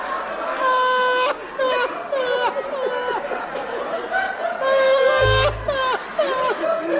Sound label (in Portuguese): risada